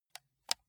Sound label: console controller option press slow